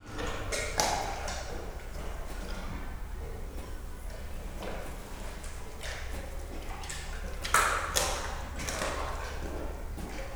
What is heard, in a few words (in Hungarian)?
vacsora fújtat, szibériai tigris; szegedi zoo 2011